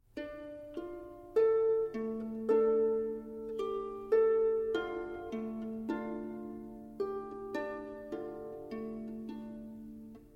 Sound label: hebrew gong